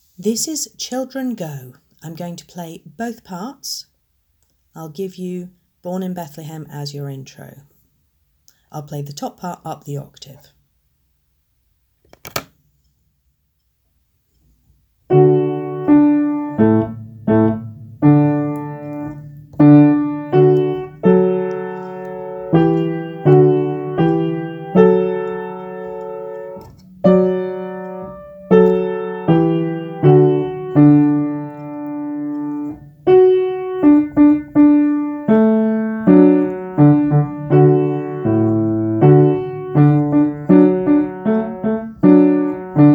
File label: children go, cello